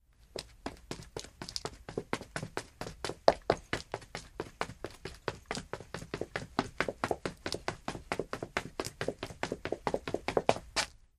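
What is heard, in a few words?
Footsteps Female Run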